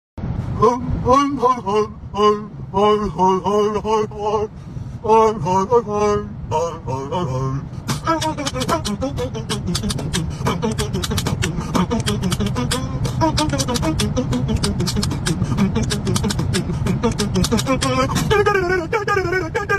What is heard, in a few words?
Beatbox Sound Effect; FNAF